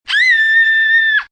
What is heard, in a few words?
Scream Kid High, Screams, child, girl, short high-pitched, frightened, fear, terror; human voice